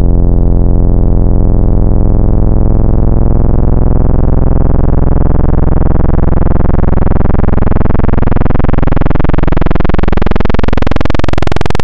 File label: Analog PWM Custom, wavetable